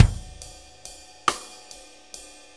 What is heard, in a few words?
6-8 Loop, Full Kit Loops; Drum Tools